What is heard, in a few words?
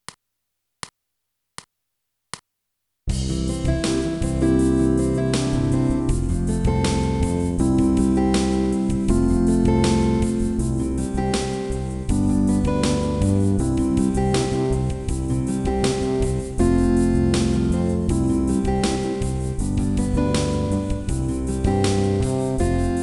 twinkle backing